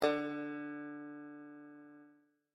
banjo low d note; beginning 5 string

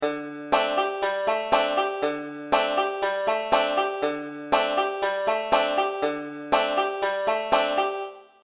d7sus4 w hammer frail, banjo; beginning 5 string

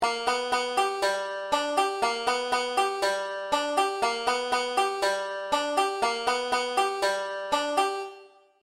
g w hammer 1 3 finger, banjo; 5 string

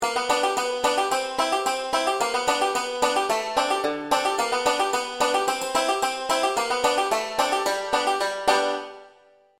boil cabbage hammer w melody, banjo; beginning 5 string

